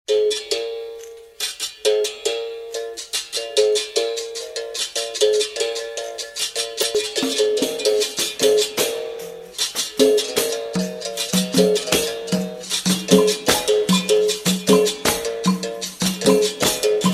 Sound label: Capoeira